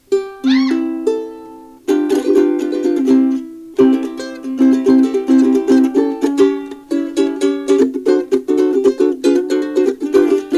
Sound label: telecaster concert, ukulele; k-wave